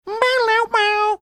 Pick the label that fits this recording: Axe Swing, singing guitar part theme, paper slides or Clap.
singing guitar part theme